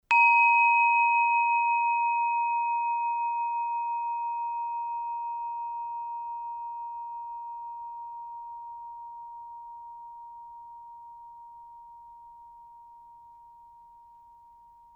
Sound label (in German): Glocke groß, bienenkorbglocke